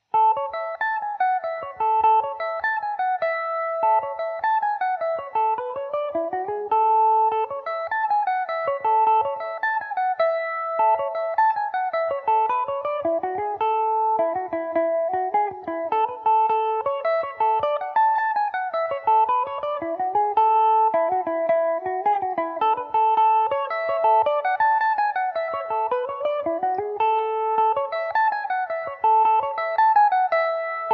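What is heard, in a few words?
portrush A major revelation, mandolin; Revelation Jaguar electric mandolin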